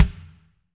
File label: BD, Roland; Sound & Vision - Gigapack I